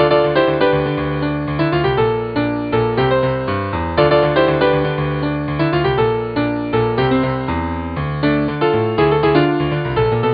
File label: piano; cstrike; cs evian